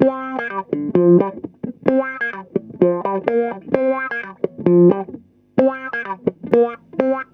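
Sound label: GTR, guitar; USB Soundscan vol.32; Roots Disco; AKAI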